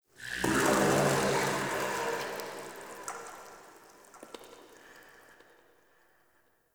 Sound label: Covered Vine Area Wet Gurgle; Ambience; Misc Outdoor; Warframe; Infested Eris